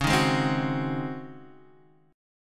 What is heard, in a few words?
C sharp Major 7th Suspended 2nd Suspended 4th, Guitar, Half Step Down; C#M7sus2sus4